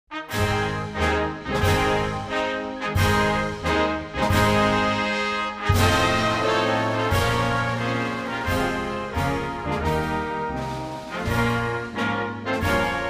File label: Syria National Anthem